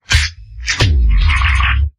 Sting Cyborg Chatter

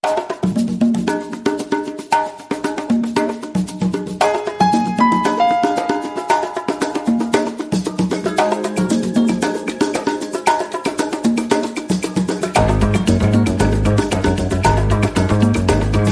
Light Mix

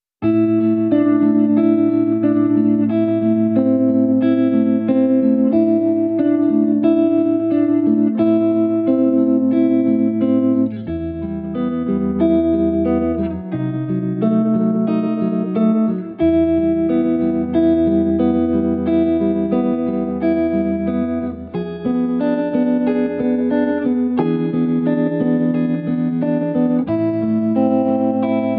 Vibrato Guitar Only

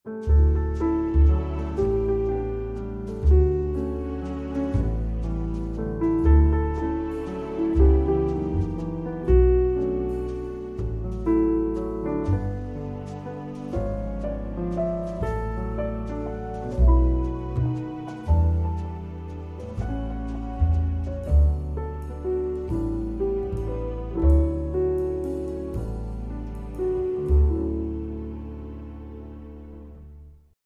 With Trio Strings; 30sec

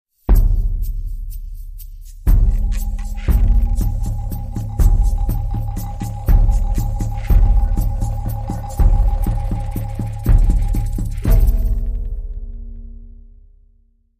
Slow Savannah Rhythm

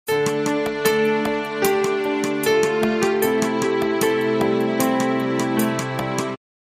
Drifting

